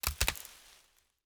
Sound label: foliage break